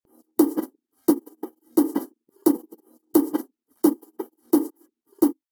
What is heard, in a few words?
snear body; regroover